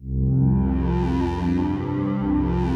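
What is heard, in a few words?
FILM MUSIC C2, PROPHET VS; Greytsounds VOL-1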